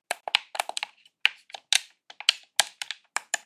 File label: Joystick movements and clicks while playing games